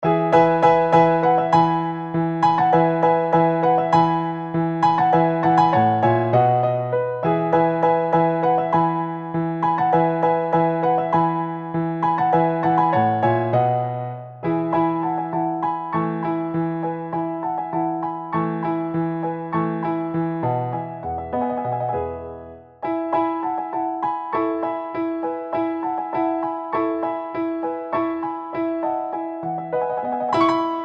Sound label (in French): Accompagnement